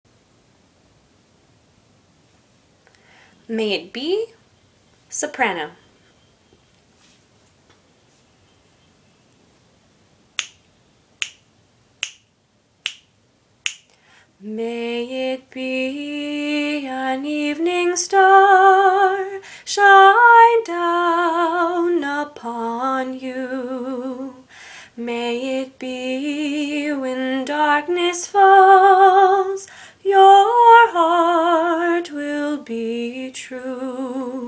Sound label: Vocal Soprano